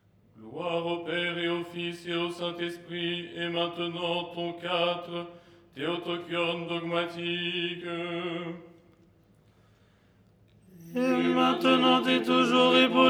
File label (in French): tenor; " Gloire… et maintenant… "; Les 8 tons en français; ton 4